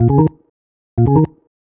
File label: organ D#, vocal house; PS-28 Vocal House Samples; organs